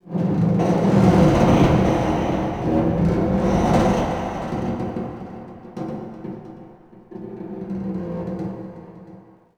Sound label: harbor occasional